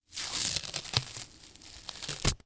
Horror Scary Skin Tear Slow

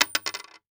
Impact Coin Quarter Dropping On Wood Table; Impacts

